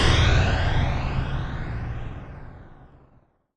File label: Big Impact Sweep